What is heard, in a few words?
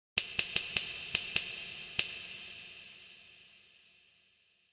lexverb, Snap, reverb